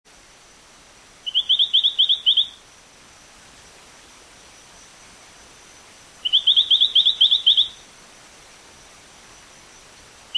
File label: Allobates zaparo